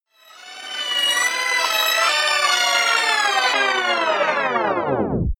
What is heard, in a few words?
tape rewind